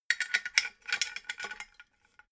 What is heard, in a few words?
metal scaffold pole splint; industrie-wirtschaft